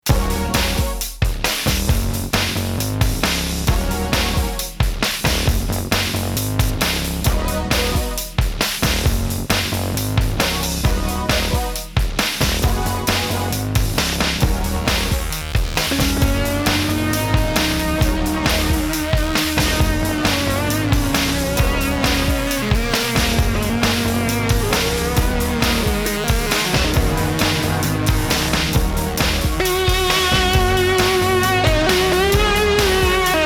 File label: Drum and bass